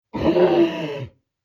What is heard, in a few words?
Angry Prehistoric Dinosaur; Fantasy; Andrew Fleming